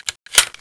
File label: ssg mag in; firearms